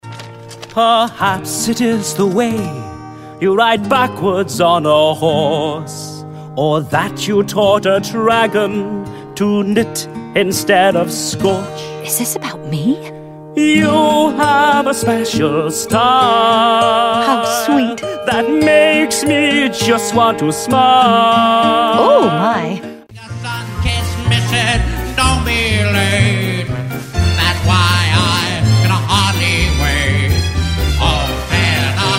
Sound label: Singing